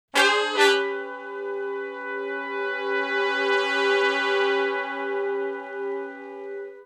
Shuffle Blues End Chords [bII, I] (A), Horncraft for R&B; Sections; Crimson Blue and Fabulous Horncraft 4 RnB